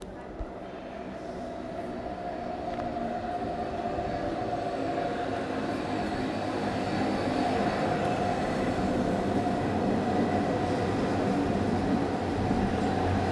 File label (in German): S-Bahn